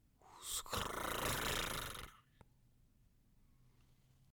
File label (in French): fermeture rideau